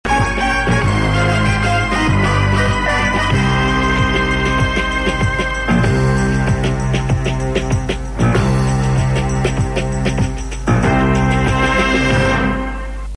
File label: jingle; tele5 kino; tv total